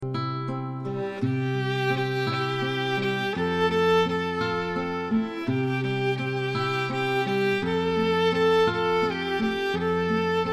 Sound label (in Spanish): canción interpretada por violin y guitarra